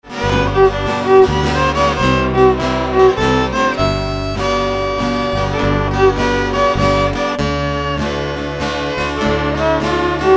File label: Starlight Waltz